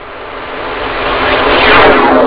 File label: scifi